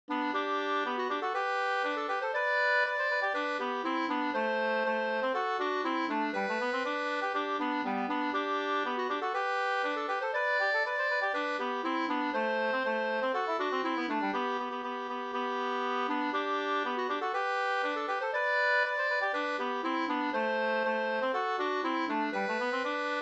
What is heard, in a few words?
polka galopp; e Hilmar Alexandersen 2st